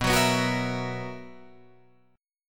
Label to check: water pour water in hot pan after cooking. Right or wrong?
Wrong